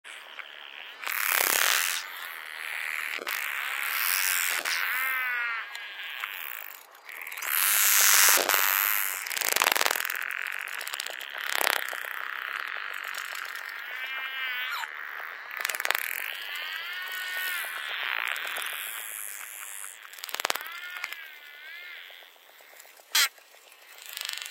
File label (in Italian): Vocalizzazione Grampo